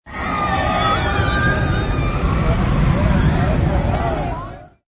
Screams of people on a roller coaster as they fly by.; Rides; Games